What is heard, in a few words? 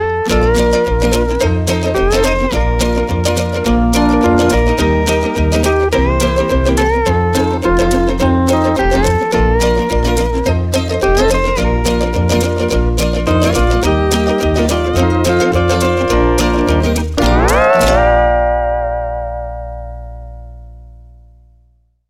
Broadcast Outro